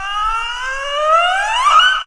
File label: aooooooup, female vocals; MISC and SPOKEN WORD